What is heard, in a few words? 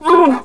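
Wookiee female hit; Star Wars Knights Of The Old Republic I; XBOX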